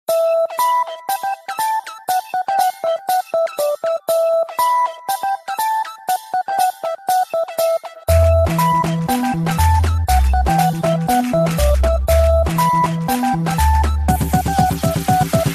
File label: dj remix